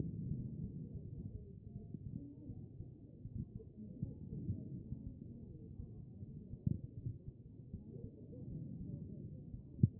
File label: chest; B MODE